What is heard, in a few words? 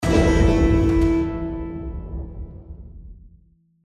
big Win; Champions of Valhalla